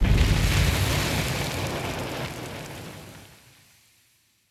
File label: straight fire